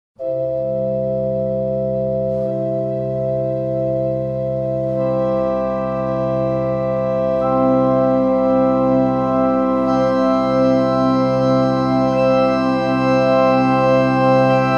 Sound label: crescendo